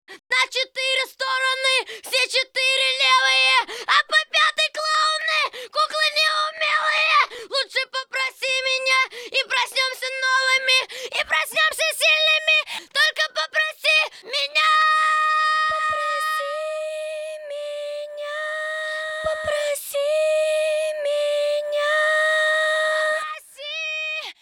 pripev lead

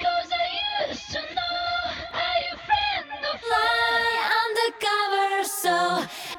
vocal loop, acapellas

